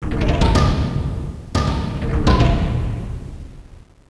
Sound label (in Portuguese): PERCUS